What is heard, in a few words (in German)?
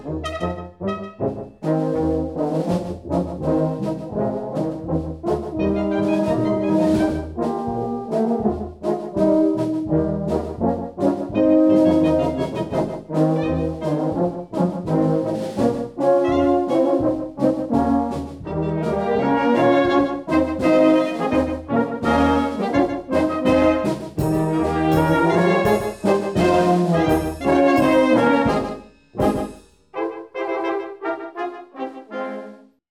Brauhauspolka